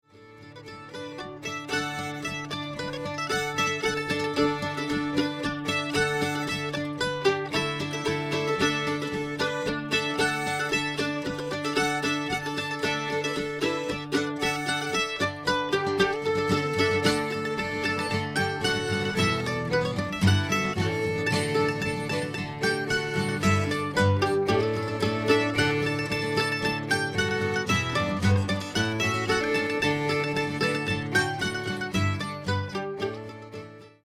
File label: String Band